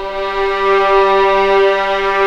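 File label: G3 legato piano, violins; East West; The Ultimate String Collection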